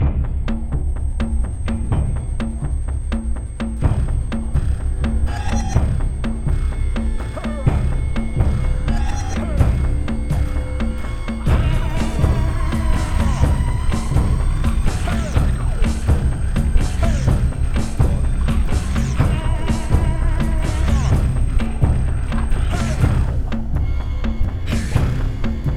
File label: man on fire